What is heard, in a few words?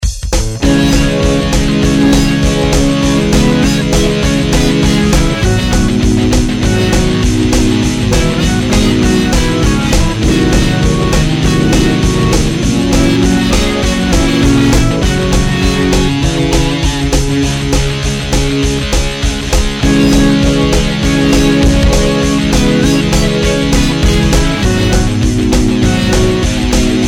rock the house